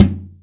smooth tom one shot G key, Royality free tom drum one shot tuned to the G note